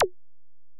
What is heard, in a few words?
EPerc, Alesis DM-PRO; 300 Drum Machines